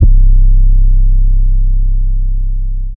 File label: 808 C; naushniki